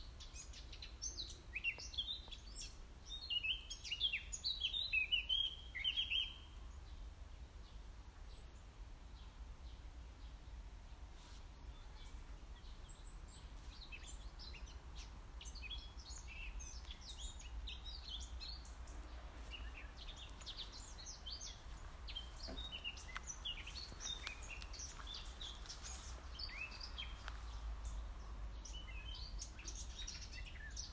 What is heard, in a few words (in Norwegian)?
fuglelyd